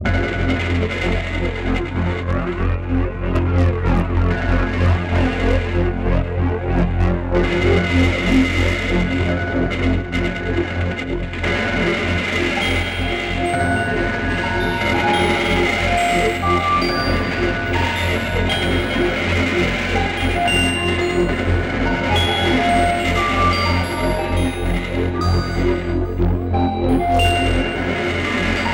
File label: feedback in ableton